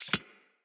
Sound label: Arachnoid Micro Step; Enemies; Corpus